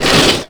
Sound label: revert metal, skater; Tony Hawk's Project 8; XBOX